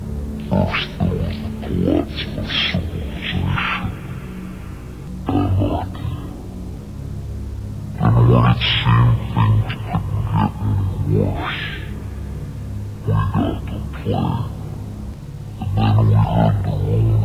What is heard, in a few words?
fender; flipper